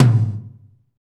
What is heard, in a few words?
TOM, Roland; Northstar - Drumscapes Roland